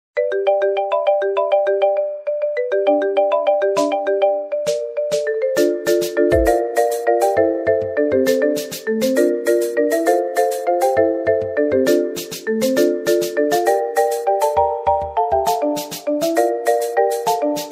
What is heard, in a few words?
iPhone Ringtone, Pirate Marimba Remix iPhone Ringtone; Pirates of The Caribbean